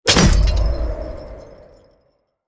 Grenade Explosion; Krampus Trap

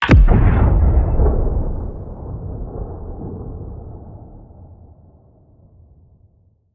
Warp Sequence Exit Boom; Skybox

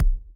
Ball Player Hand Hit Tackleball Light

